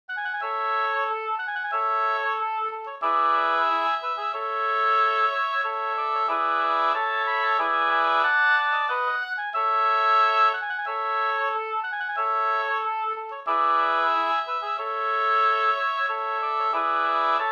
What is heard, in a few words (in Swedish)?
ganglat; Solskensganglaten fr Leksand